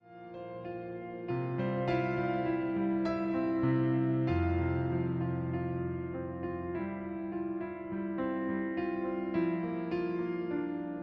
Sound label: Piano Version